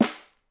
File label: SN, Percussion; Miroslav Vitous - Percussion & Harp